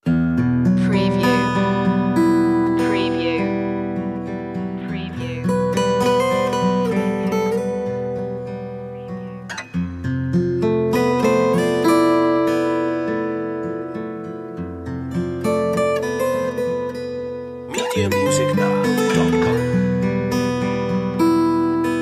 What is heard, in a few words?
deepest regrets